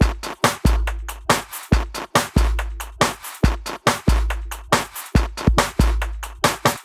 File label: Breakbeat; Vengeance Samples; VEC2 Loops